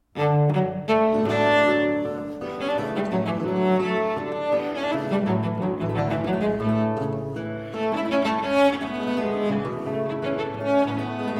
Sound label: Cello Sonata No. 7 in D Major, Allegro; Platti